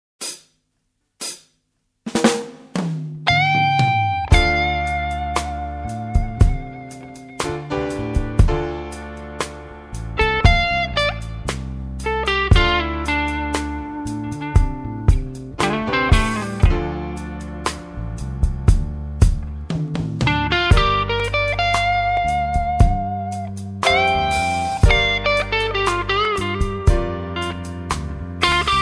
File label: blues jazz